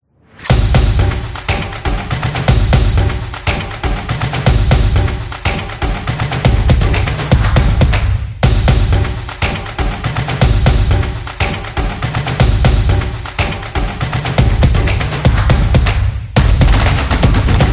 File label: Rhythmic; high energy